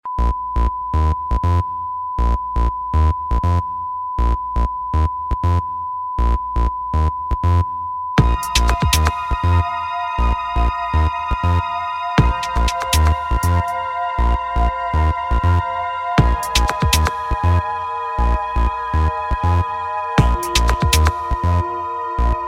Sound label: smooth melody